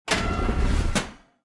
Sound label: Field Object Iron Gate Open; starbites